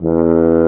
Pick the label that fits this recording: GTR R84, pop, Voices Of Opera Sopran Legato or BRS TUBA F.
BRS TUBA F